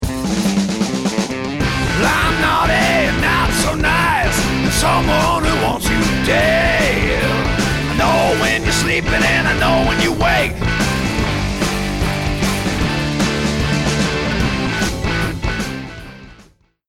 start action, music

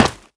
step run, footsteps, gravel; player

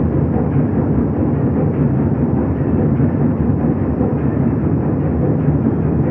machine, ambient; nuke

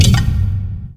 fs fm lightbridge, footsteps; Portal 2

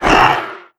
grunt soft, antlion; creatures; vox

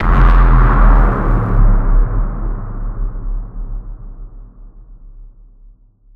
vault pulse; Half Life Alyx; music

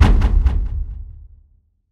strider steps lfe; creatures

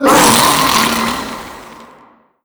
death, zombie vox; creatures

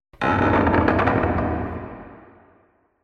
ambient wood creak low

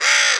squawk pain, crow; critters

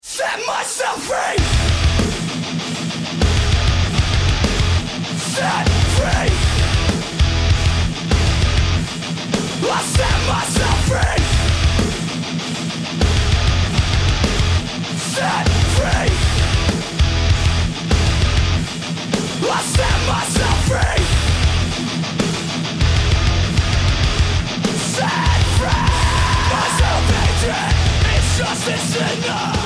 bomb planted

